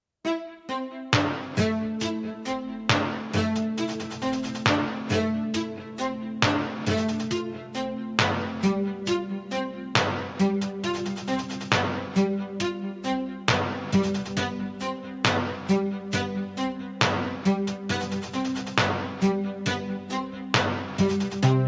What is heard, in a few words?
melody